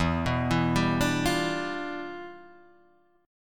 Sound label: E Minor 7th Flat 9th E